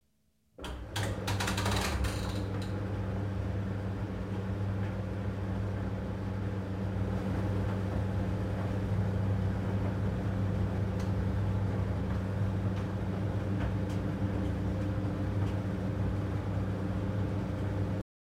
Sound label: Dryer